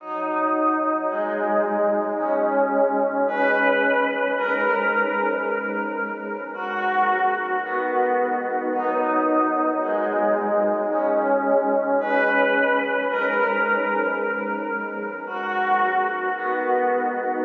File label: 110 BPM G# Min Pad; Stems; Cymatics; Cymatics - CHAOS Beta Pack (MIDI + Stems); Dead Inside